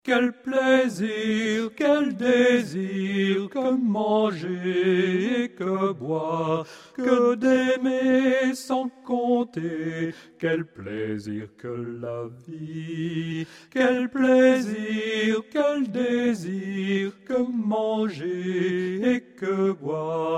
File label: Soprano; les 4 voix ensemble